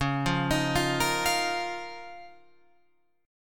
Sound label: Db 6th Add 9th Db down Guitar Open F; Db6add9; arpeggiated